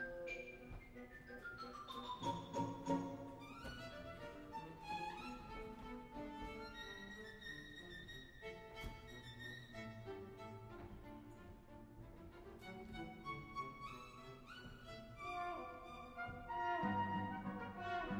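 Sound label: Orchestra de Lyon; Shosty Nose Kazushi Ono Orchestre del Opera de Lyon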